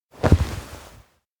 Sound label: hug kigurumi; awayuki; anemoi パッケージ版 1.1